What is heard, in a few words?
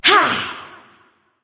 grunt attack; smite